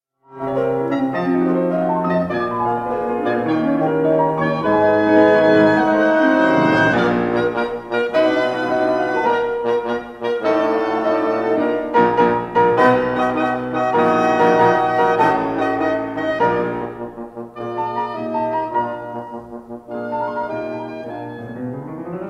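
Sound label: wind ensemble, quintet in E flat major op 16 for piano and winds; ludwig van beethoven; dennis brain wind ensemble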